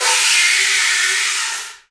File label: Bullhorn, Afro Horns, Africa